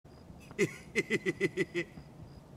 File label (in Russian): Смех дикого; Escape From Tarkov